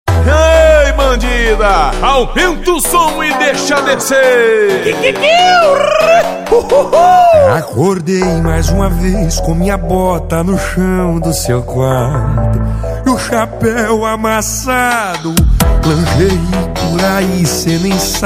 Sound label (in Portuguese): sertanejo com locutores de rodeio